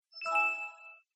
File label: Windows 7 Shut Down